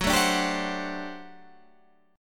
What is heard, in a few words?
G Major 7th Suspended 2nd Suspended 4th F sharp